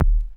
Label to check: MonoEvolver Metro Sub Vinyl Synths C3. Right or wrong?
Right